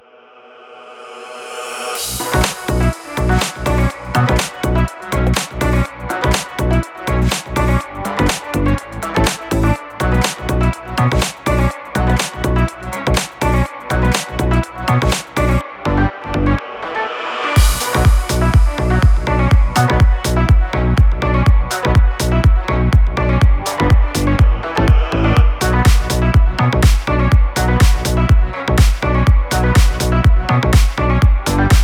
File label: house electronic techno; By Burak Sound Bank